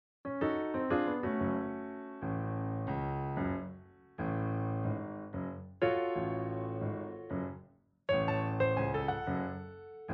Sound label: song piano; Little Mermaid; Ursula; Accompaniment; Disney Cruise Line